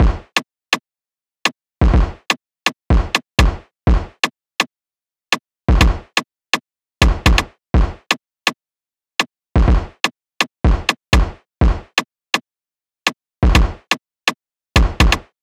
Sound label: Drum Loop - 124 BPM; Anubis Drum Loop 2; Cymatics